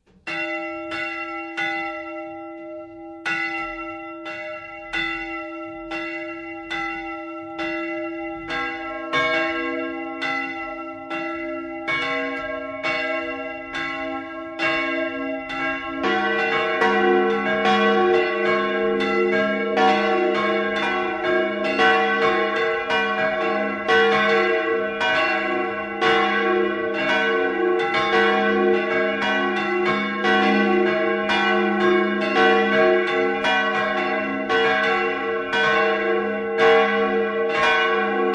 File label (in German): Glocken aus Seekapelle